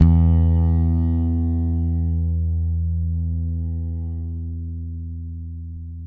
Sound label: FRET BUZ E, PEDULLA BASS; Masterbits CLIMAX 7 - Bass